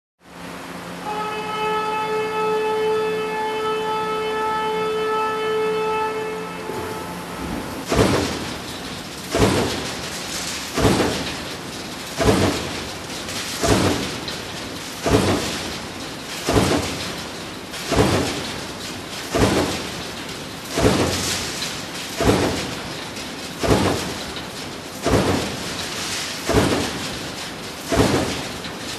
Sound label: Automatic Crushing Machine with horn